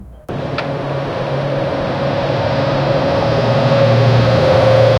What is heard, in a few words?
vehicle engine running, airport